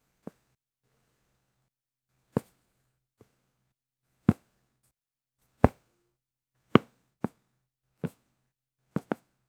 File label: close up sound of a leath, footwear